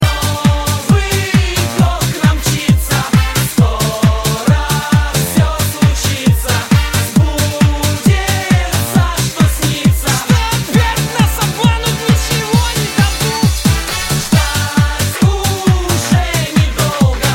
russian noviy god; ringtones

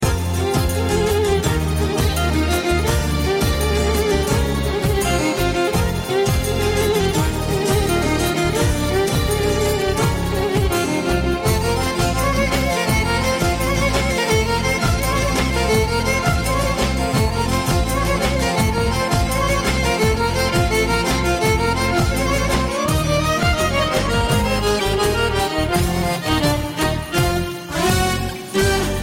Albanian Folk

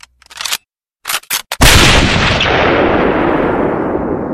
dragunov svd shot

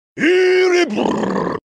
Electabuzz

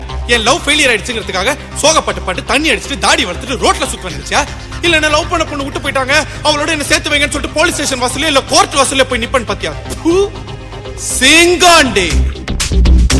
simbu love bgm

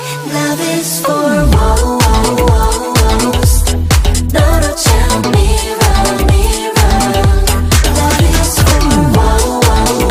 synth fx

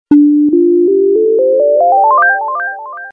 Windows 8 SMS New

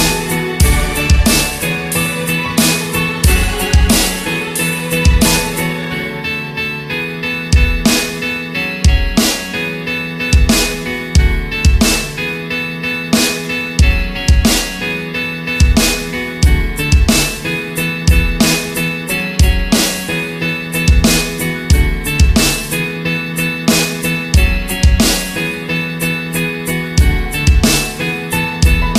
Inspiring Happy

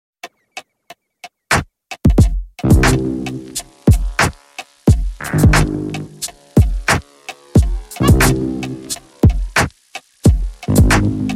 heist instrumental; jazz

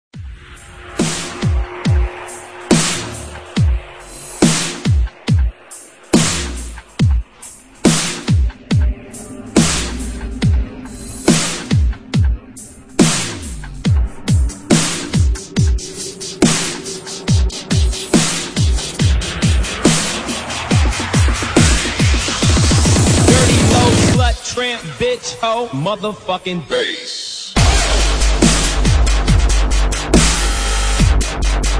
dubstep

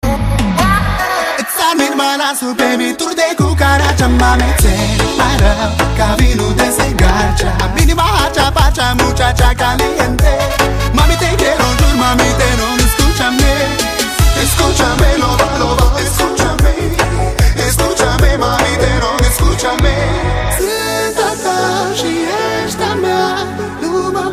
Latino

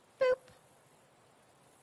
Is Calling Rap, ringtones

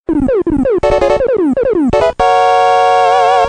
beats boss

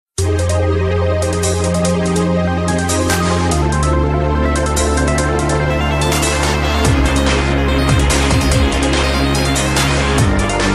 electro

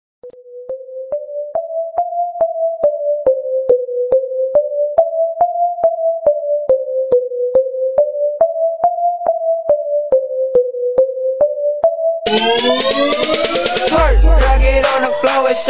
twerk song

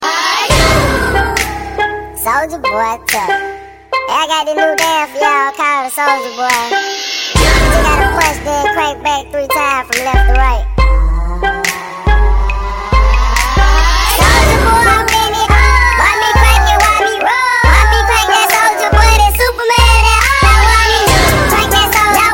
chipmunks tone

